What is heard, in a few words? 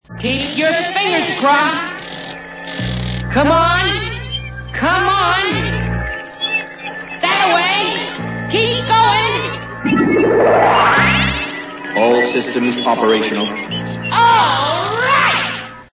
boot; space sentinels